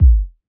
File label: 120bpm, Kick; Melodeep Kit 2